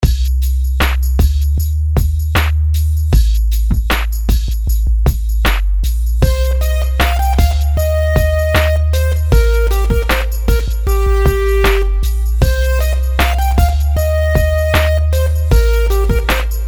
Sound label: rhythms simple 16ths